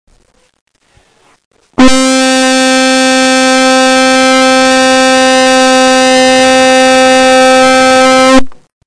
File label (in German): fluegelhorn, ton a